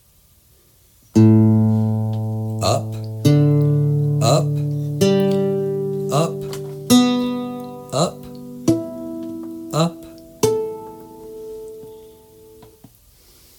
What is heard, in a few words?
normal lute tuning